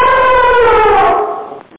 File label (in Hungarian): elefánt